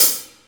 pedal hi hat sound d sharp; hat samples

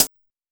crispy closed hi-hat sound a sharp key

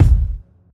short reverb kick g sharp key

